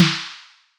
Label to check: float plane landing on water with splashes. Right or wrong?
Wrong